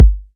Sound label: natural bass drum single hit g sharp key